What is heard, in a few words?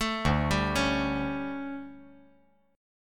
D Major 7th Suspended 4th, chord